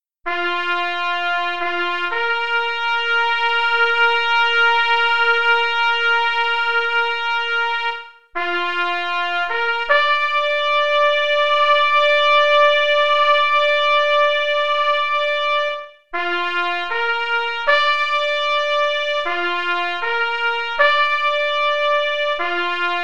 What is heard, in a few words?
Bugle Call Taps